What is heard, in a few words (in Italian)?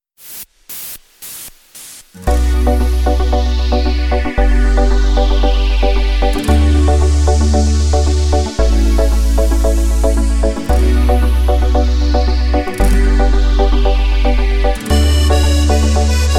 Reggaeton